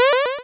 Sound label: pow; pygame shmup